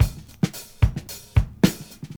74 bpm high quality drum beat A key

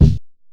kick drum sample c sharp key; tuned kick drums

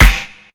hq hot steel snare drum sample f sharp key

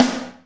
snare c key

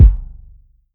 roomy kick b key

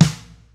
snare sample E key

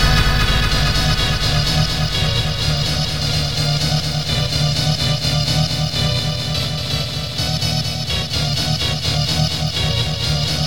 rave sequence d sharp m 126; synth loops

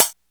smooth pedal hi hat single hit f key

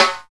raw steel snare drum sound E key

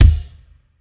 subtle reverb kick drum one shot D# key

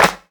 smooth clap sound g sharp key

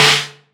snare single hit D key

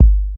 clean bass drum sample g sharp key

